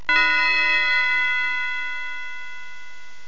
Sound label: bell